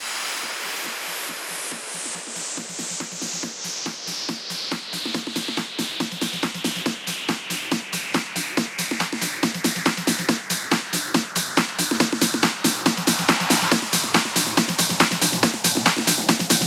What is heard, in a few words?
140BPM 16Bars, HandsUp & Trance Fills; HelpfullLonger; Vengeance Samples